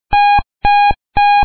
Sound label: 3 beeps